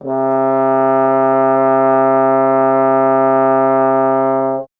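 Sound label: bass trombone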